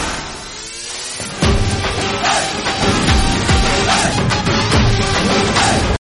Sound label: Notification